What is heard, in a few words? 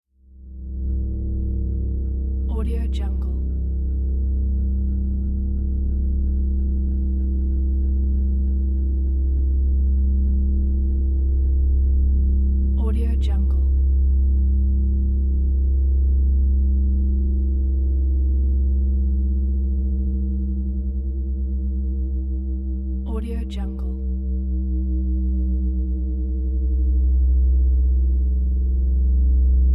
spatial ambience